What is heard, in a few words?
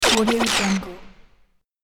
droid mech sound; sonic-boom